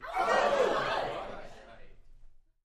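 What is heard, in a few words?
Crowd 15 Interior Rowdy; small int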